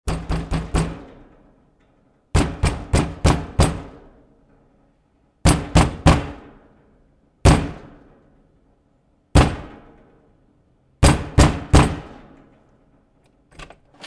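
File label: scary fist several times and door opens; Звук стука в дверь для сказки; strashno kulakom neskolko raz i dver otkryvaetsja; Для детей, для детских постановок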